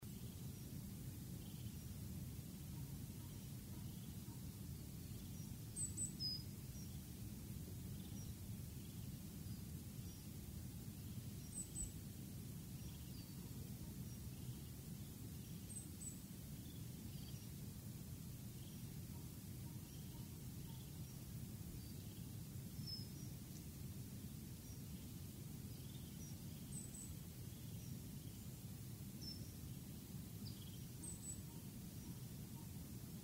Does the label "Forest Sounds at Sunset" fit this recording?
Yes